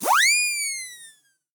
Run

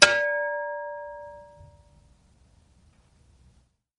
bell

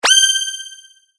sword

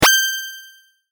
sword

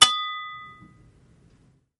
bell

